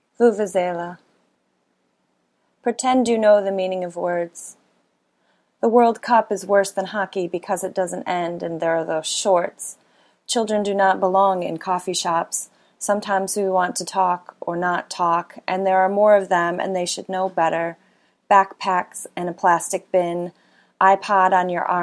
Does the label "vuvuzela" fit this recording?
Yes